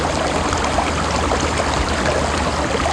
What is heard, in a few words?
run water, ambient